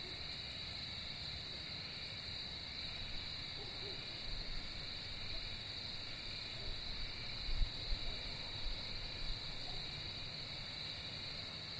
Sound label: my neighborhood at night